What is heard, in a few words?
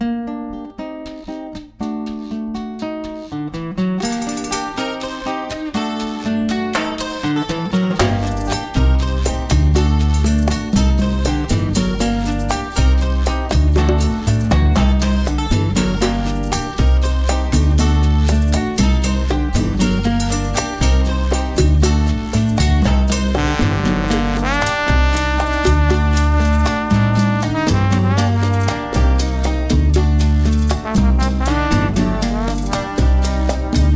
Rumba Mambo Cha Cha Cha